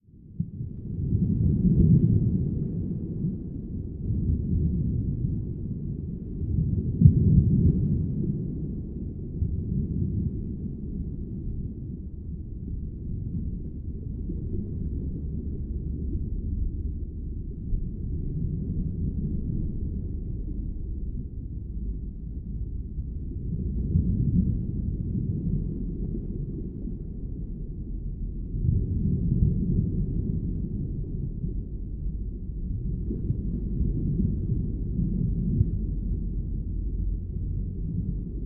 Underwater Ambience Deep Sea - Deep Underwater Waves And Bubbles; Hollywood Edge; sound designer's toolkit v2